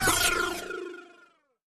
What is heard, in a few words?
Dweeble Tone Transition